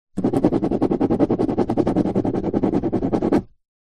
pen ball point scribble scrub out paper on plastic table; office